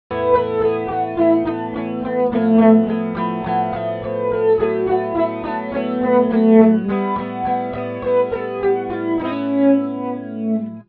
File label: Turbo Flange falling flange